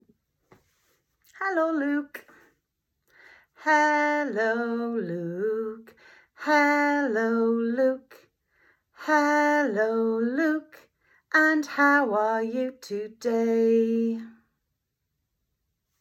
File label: morning song